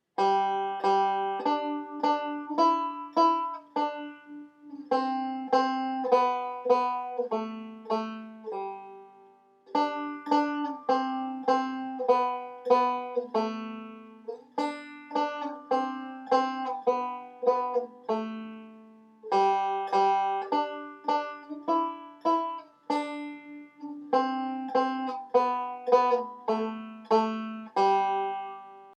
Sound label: twinkle single notes